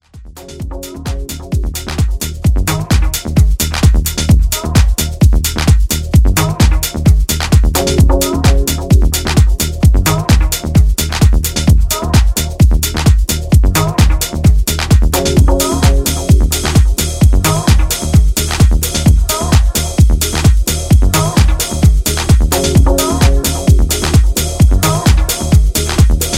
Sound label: Sliding Sine; House